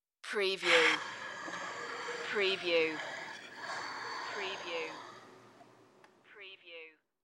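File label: Stool Swivel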